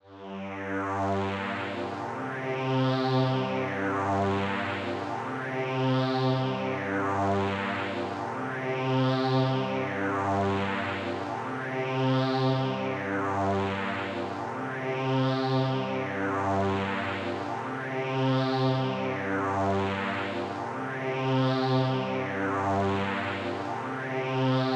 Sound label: 155 BPM G Min Low; Cymatics; Destiny Gold Expansion; Neon